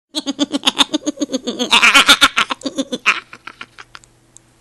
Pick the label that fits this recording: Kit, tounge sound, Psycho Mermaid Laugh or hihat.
Psycho Mermaid Laugh